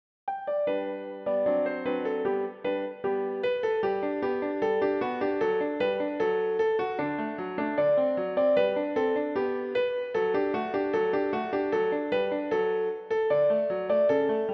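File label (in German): Der Vogelfänger Klavier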